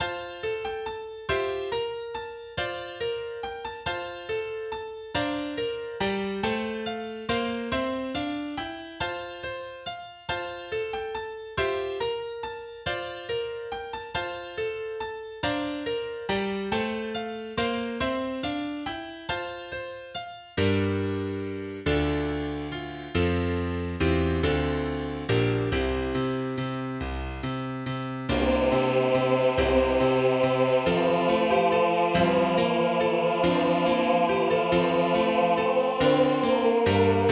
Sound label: Gloria Patri, magnificat; capella